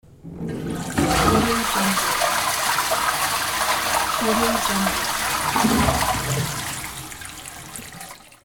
toilet flushing sound

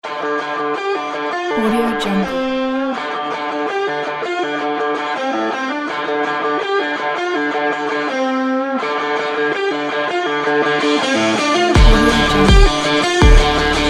hard rock

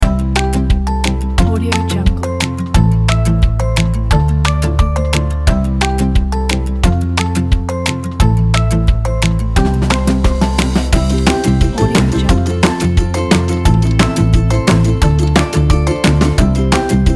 kids having fun

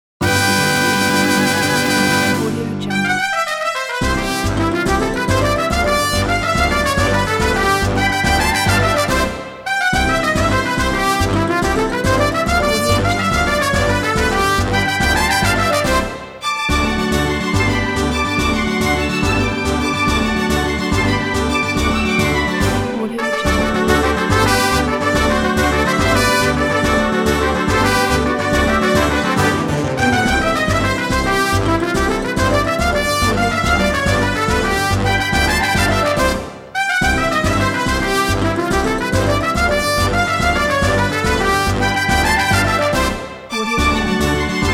mexican hat dance

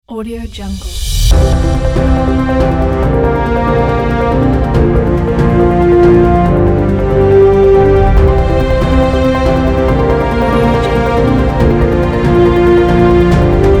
news logo; 2brosmusic

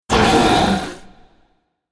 vampire kill

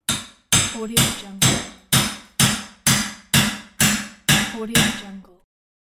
hammering chisel interior room

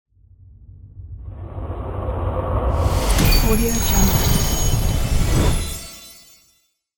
magic spell

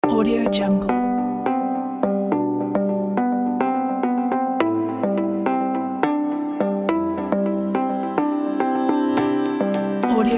technology beat

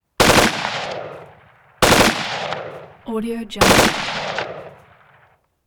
assault rifle; prosoundfx

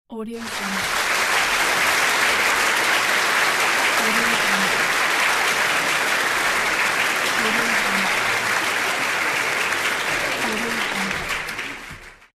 big applauds

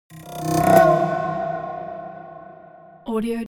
cinematic swell haunted strings roll high

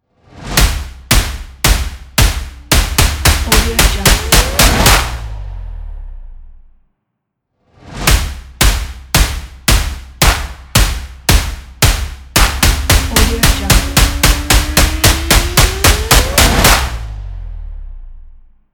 hits stomp logo

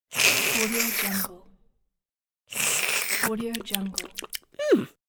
cartoon character slurp

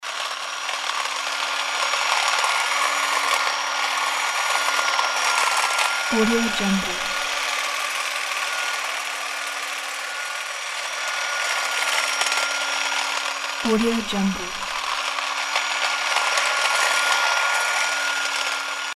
hedge clippers loop

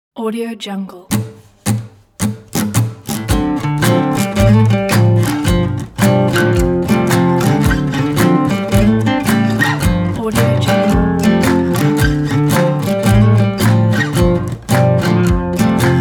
acoustic cheerful jazz blues

